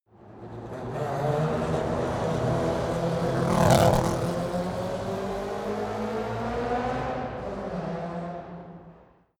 racing car f1 drive by

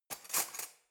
cutlery knife drawer grab hard

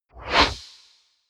wipe

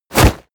hit armor leather

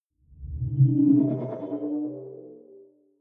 sci-fi display transmission damped

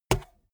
bmx bike tire kick

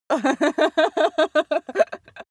female laugh

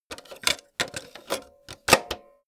tape player insert cassette

